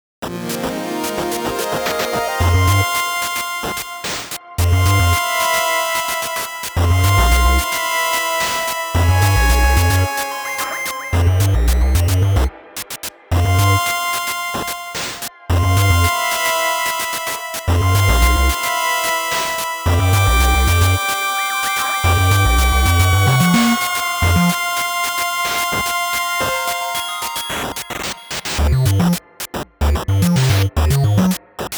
8bit Version; Super Mario Odyssey; Inside the Inverted Pyramid

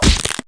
Bat hit Baguette; Rabbids Big Bang; Android iOS Windows gamerip 2013

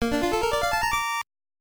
Item Jingle; Indora no Hikari; NES

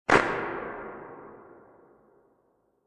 Clap Hit Echo

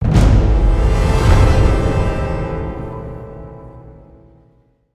Stinger Merc Camp Captured Enemy; Heroes of the Storm

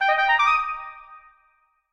Whisper Left; king s quest chapter 1 a knight to remember gamerip

